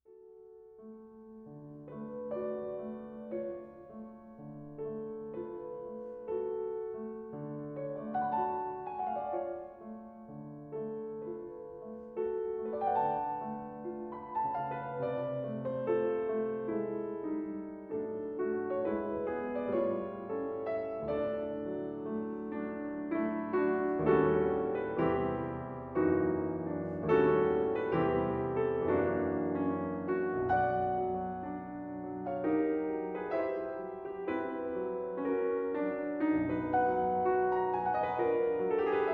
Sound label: Berceuse in D Major